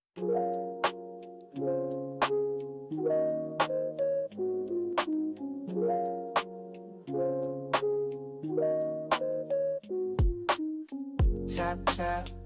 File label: RAINFALL